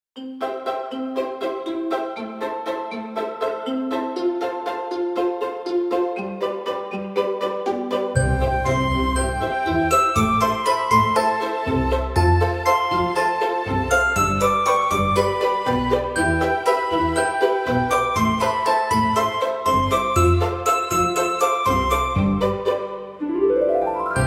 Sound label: Paper Violin Parade, comical orchestra for slapstick gag scene